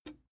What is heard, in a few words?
plastic button small simple release